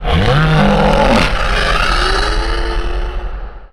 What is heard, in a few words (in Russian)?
zvuk krika zombi